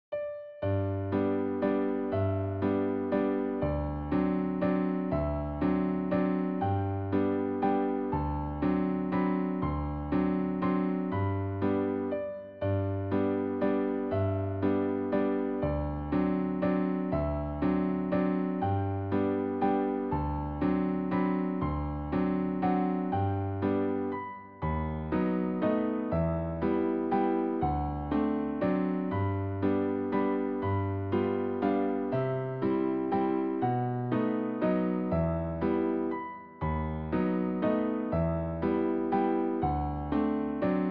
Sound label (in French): valse; poudras christophe